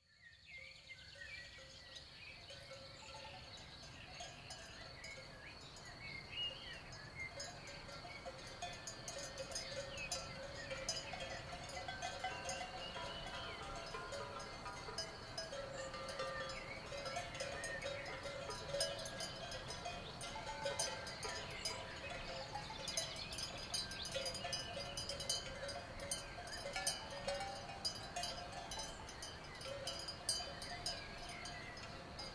Montagne

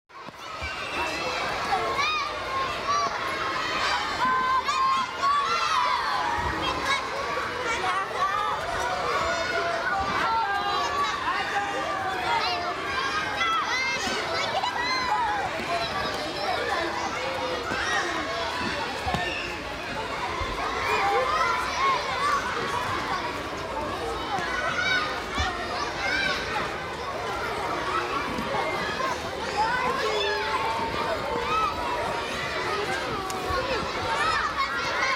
école

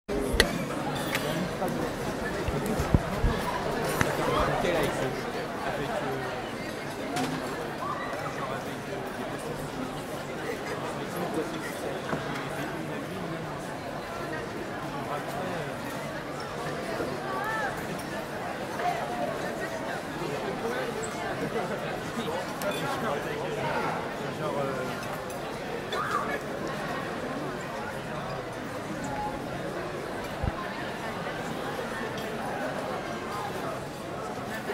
cantine

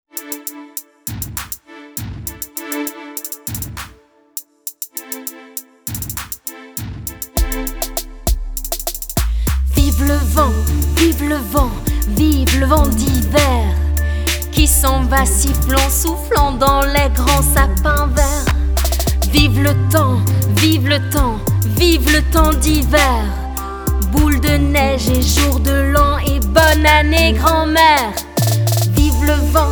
Vive le vent chant, Version chantée

